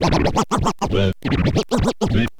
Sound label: scratch short, Vinyl scratches